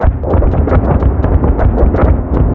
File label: plane; World of Tanks